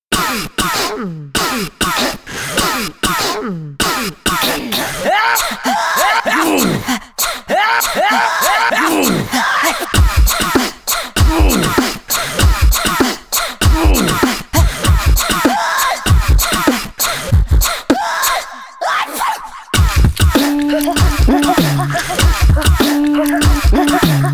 URBAN COUGH TERROR

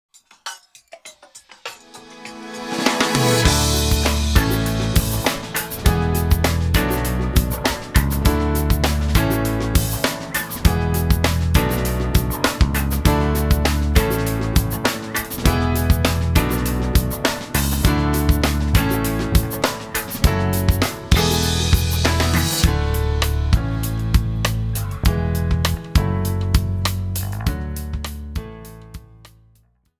Instrumental

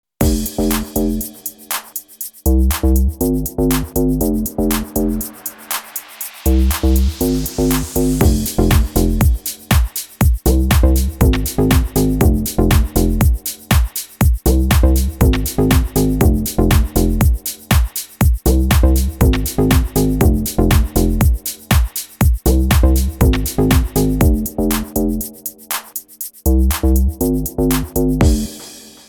GLASS BASS

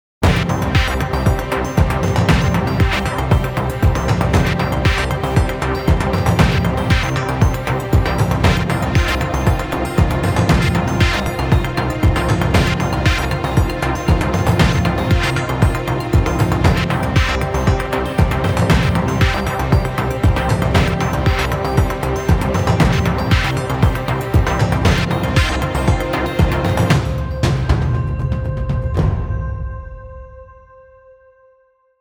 DIGITAL BASSLINE NEWS

